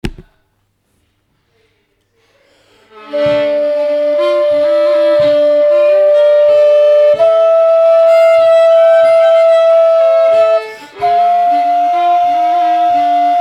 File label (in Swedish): Blåsstämma slutet